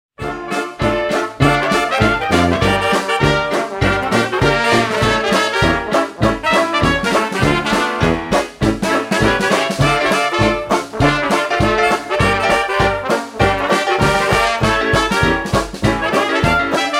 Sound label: Dixie Down Beat